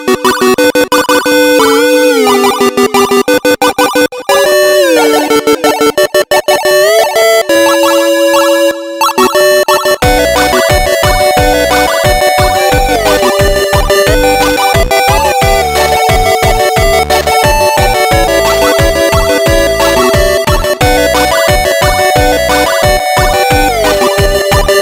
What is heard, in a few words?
8 bit version